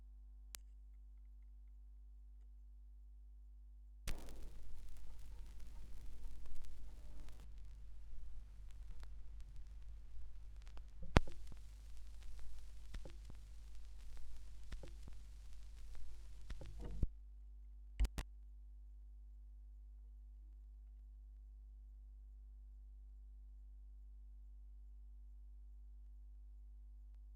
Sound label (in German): schallplattengeraeusche